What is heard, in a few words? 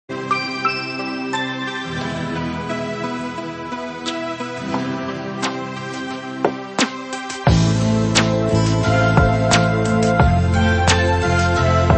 No synths allowed